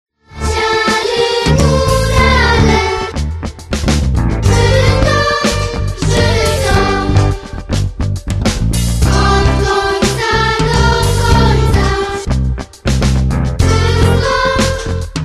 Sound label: contemporary polish village music gorale mountaineers